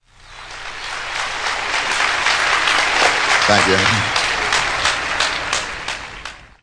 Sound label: applause thank you, MALE; MALE MISC and SPOKEN WORD